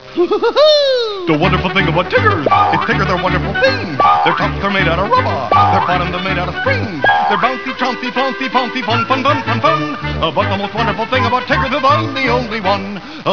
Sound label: song; quake2; tigger